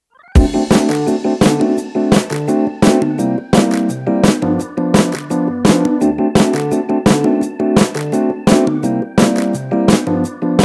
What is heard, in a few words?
cheerful entertainment; by burak sound bank; cheerful vol 5